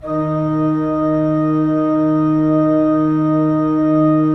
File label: 3D Pipe Mix Celeste, 3D Organs; E-MU Producer Series Vol. 5; 3-D Audio Collection